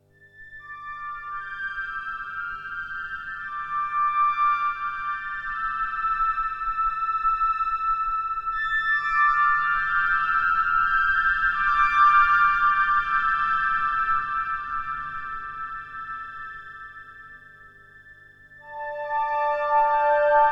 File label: Pad Soft, synthesizer; Sunsyn